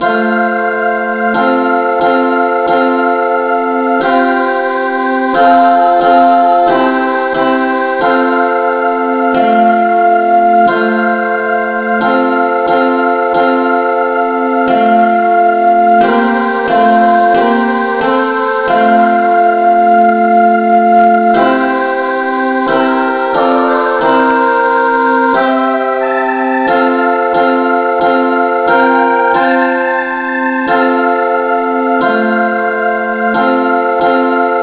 no vocal key F

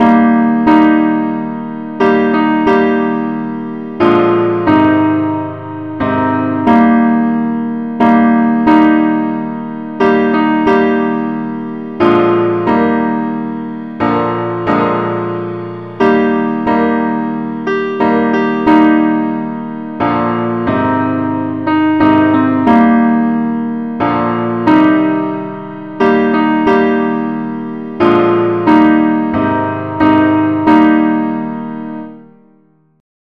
simple acc, key ees

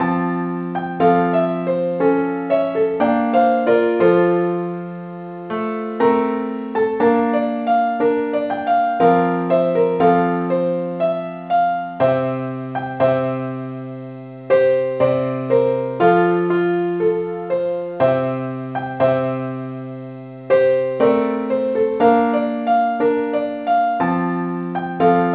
keyboard only

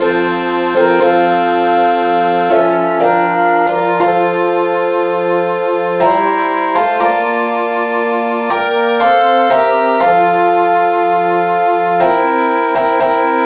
satb keyb

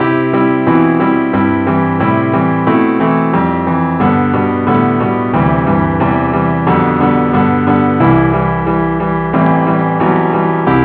keyboard only key c

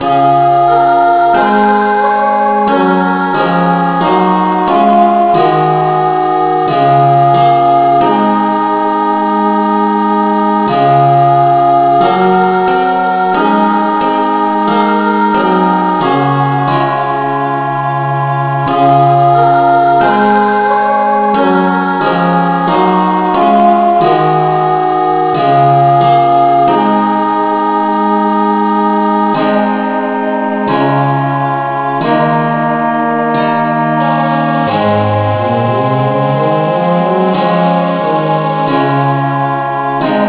revnovocal key des; Gwalchmai; Glory be to God on high

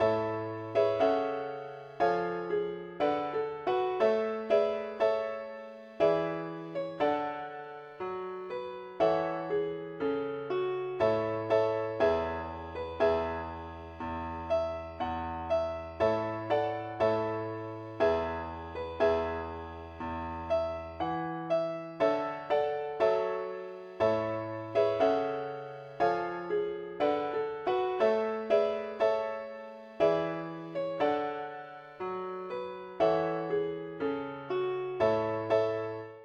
ukelele, key a; pilot

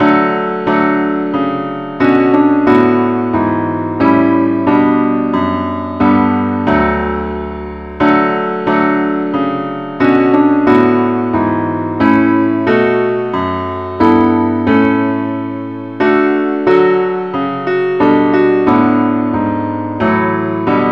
parts only, key d

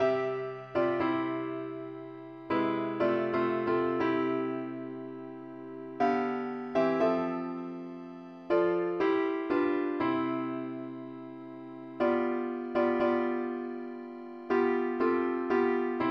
parts only key c

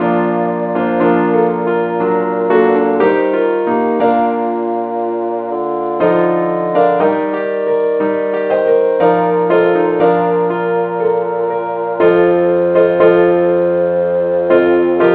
keyboard

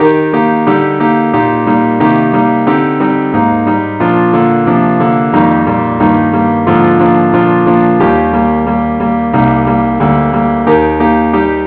keyboard only

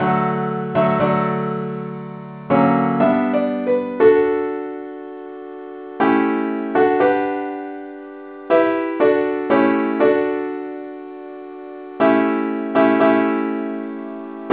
simple acc key d

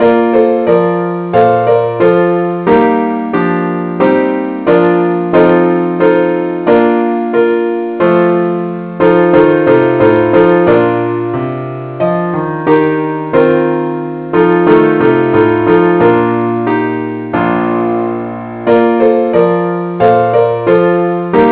keyboard Only; Gwalchmai; Glory be to God on high

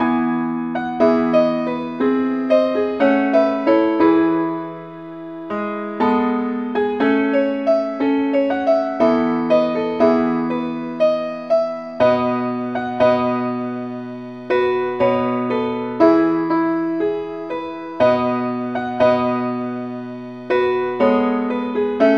keyboard Only key A

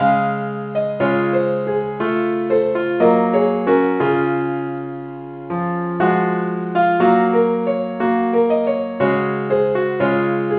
keyboard only

